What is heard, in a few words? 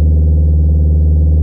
fast idle; Bildschirmflausch LD41